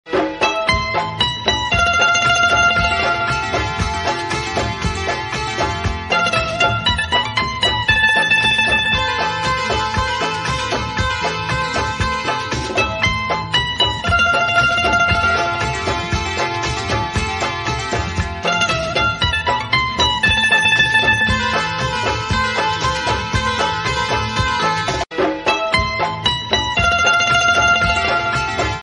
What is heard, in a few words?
old song ringtone love instrumental ringtone; saajan; madhuri